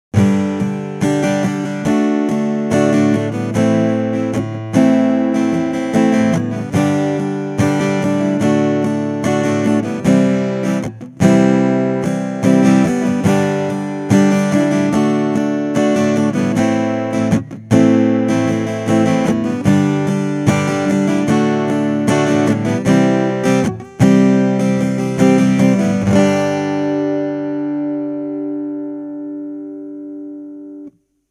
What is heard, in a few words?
strum aer